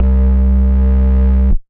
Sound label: Wonder Bass, Synth Bass; Ableton; Factory Packs; Beat Tools